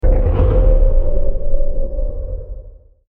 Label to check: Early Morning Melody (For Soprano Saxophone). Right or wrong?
Wrong